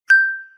Ding OnePlus Notification Ringtone

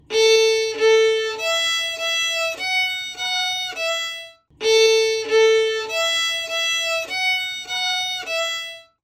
Violin Ringtone

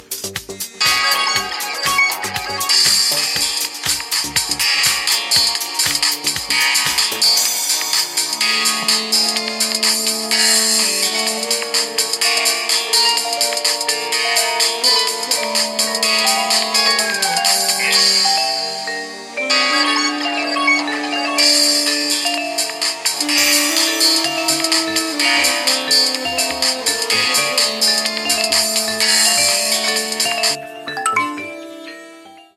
Infinix Note 7 Ringtone

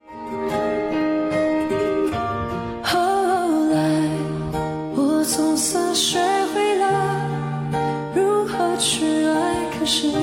Chinese Song Ringtone

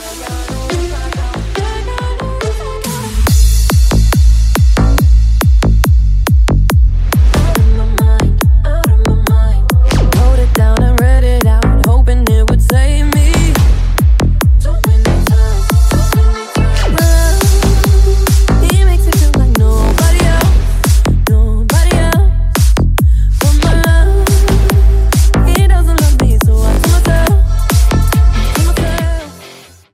Bass Version Ringtone

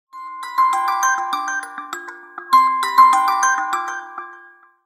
Notification Ringtone; Vivo Y75 5G

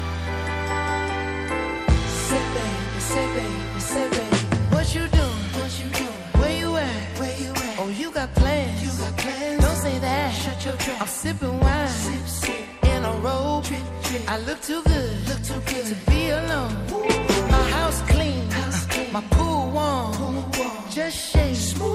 English Ringtone

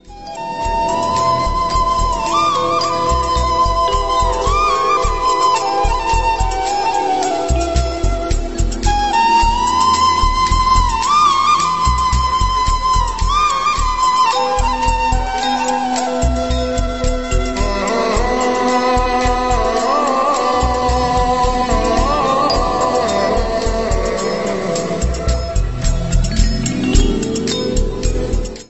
Flute BGM Ringtone

